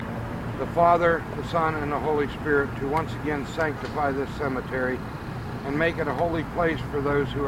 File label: sound of a whip